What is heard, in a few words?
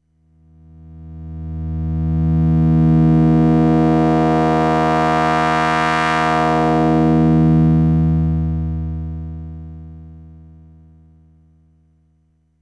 Attack